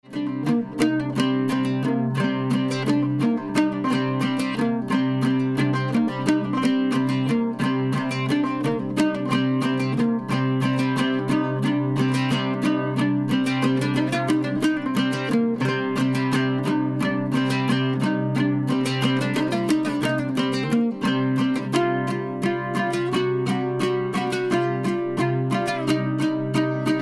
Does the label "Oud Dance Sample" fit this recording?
Yes